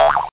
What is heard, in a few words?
Reflect; Catacombs